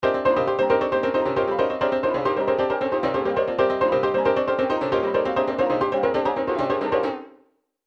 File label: trance midi loops riff; newtronic trance constuction kit